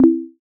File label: message